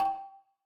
limit; TWBlue

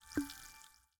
favourites timeline updated; FreakyBlue; TWBlue

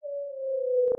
delete timeline; Qwitter; TWBlue

